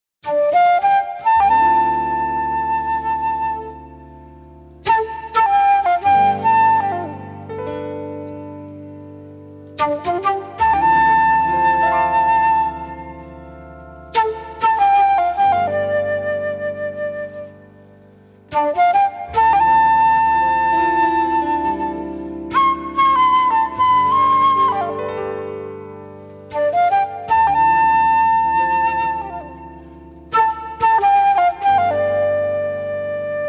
blues

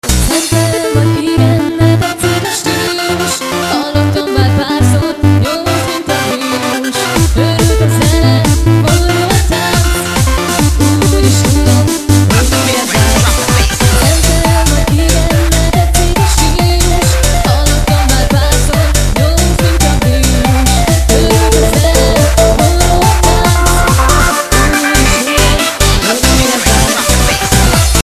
fun groove

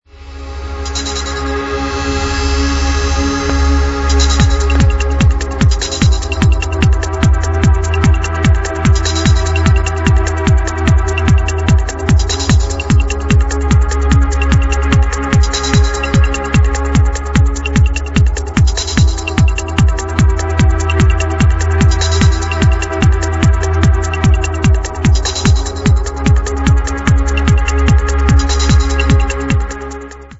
trance mix

